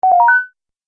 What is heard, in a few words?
Multimedia Correct, beep; Business Programs, Apps And Computing Noises; Andrew Fleming